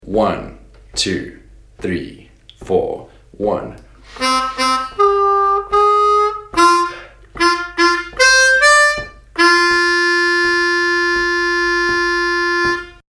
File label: Lick, harmonica